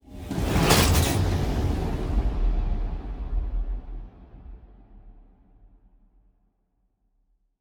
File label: ship construction ship move; Stellaris; machineage